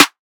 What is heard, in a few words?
Snare 808; 808Flex; NIGHTFALL